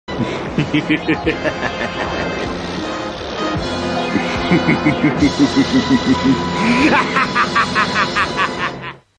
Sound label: freezer